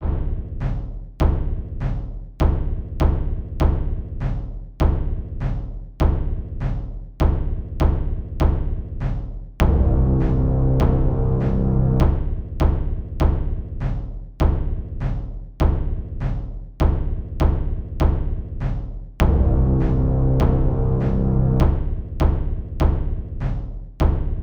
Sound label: Dungeon; Mordstreich